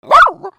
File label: DOGS